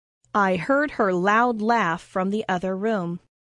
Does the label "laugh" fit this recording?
Yes